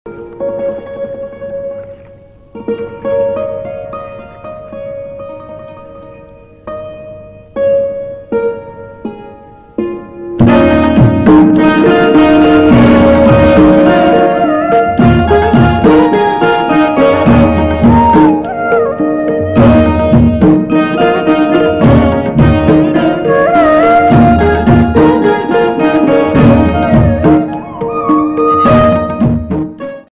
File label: bgm malayalam; thallumala